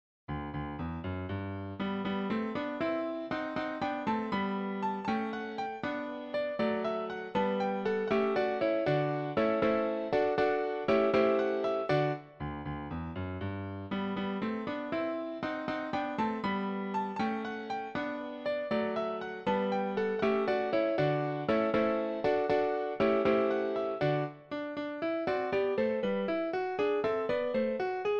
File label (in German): Scherzo des Klaviertrios; Opus 1 Num 2; Ludwig van Beethoven